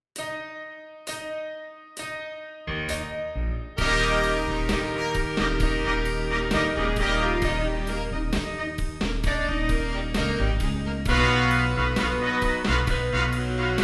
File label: Exercise